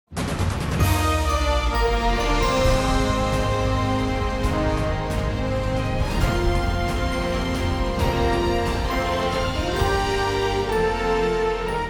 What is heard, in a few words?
Titles; Sitting Duck Music; Good Morning Britain